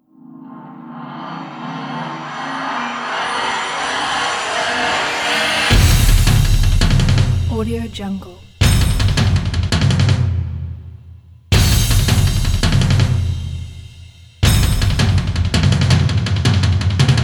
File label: cinematic drums trailer; divaproductionmusic